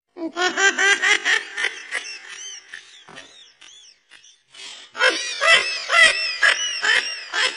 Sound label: baby laugh slow